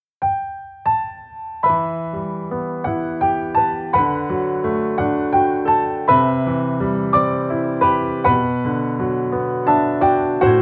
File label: The Promise Piano Version